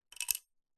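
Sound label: industrial wrench adjustable plastic handle set down garage floor; zapsplat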